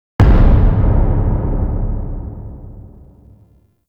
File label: Foley Bodyfall; Narmer Outpost End; Drifter